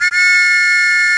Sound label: whistle; Louie